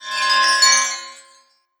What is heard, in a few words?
Exile Magic